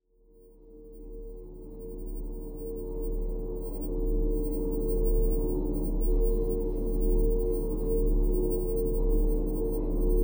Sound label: Sound Design Drones